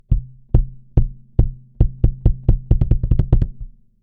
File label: smart comp kick